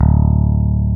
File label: E-Basses, Roland